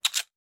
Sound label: tension wheel correct